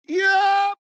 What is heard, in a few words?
auction sound